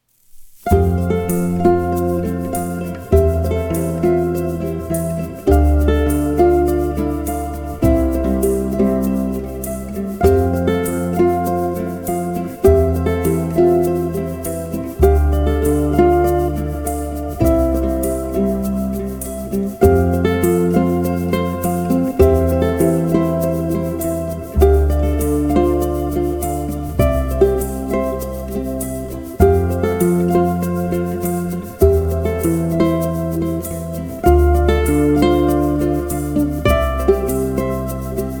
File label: positive c maj corporate